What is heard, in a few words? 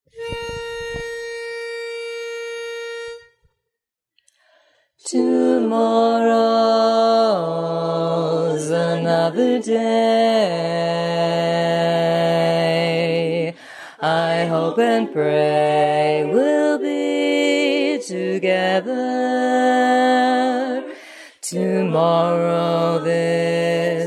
Bass